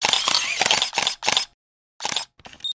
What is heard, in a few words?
camera shutter classic multi